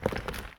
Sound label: rock hit